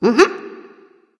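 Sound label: nonverbal agree; smite; ratatoskr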